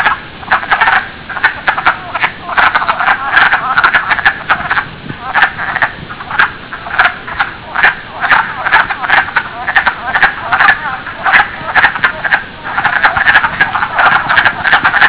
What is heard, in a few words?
wood frog